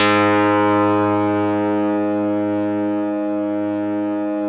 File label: RESO METAL 2 G#2; Yamaha VP-1; Music Store